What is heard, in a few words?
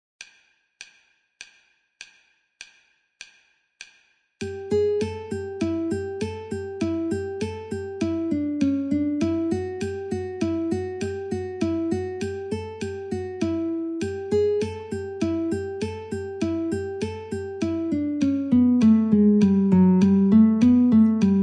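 Guitar metronome 50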